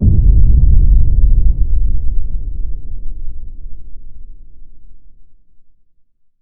soundscape indoor boom

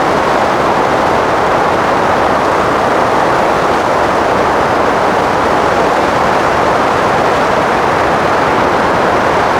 ambience wind rush mono

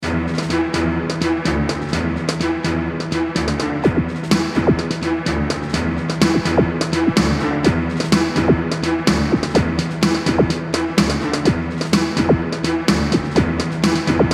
music loading

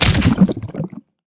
fall pain

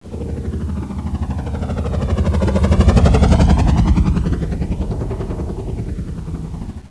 ambience heli flyby

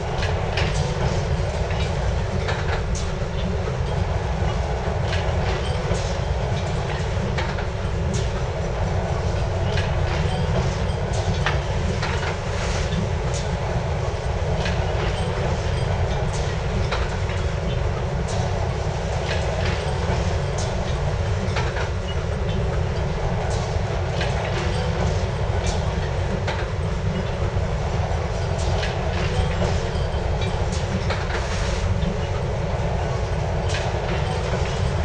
ambience industrial

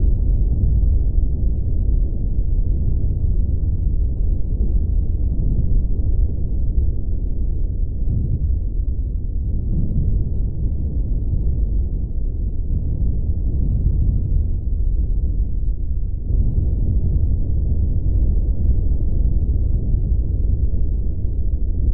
ambience disaster rumble

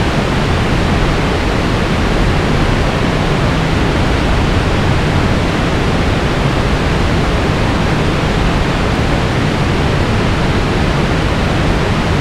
ambience water

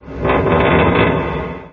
creak

